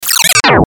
Glitch Short Beeps Descend, Glitch Stutter Warp Blip Hiss; Production Element Imaging Element Accent Transition; Blastwave